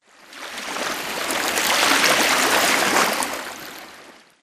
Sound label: swampland water lapping; Titanfall 2